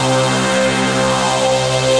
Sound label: Synthesizer Middle C Notes, Lead